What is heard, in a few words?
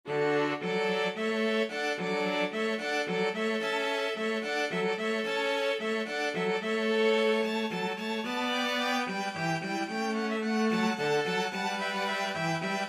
water music, string quartet